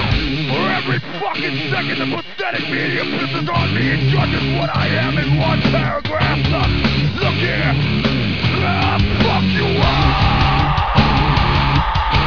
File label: rock